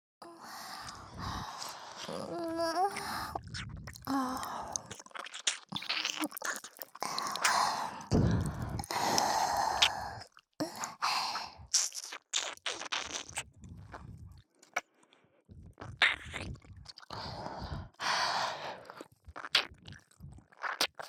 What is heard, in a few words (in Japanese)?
両耳耳舐めのみ; おまけ（フリートーク＆両耳舐め）